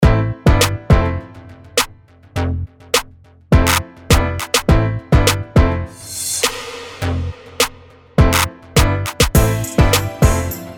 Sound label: 808 Dreams